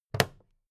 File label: plastic clasp close regular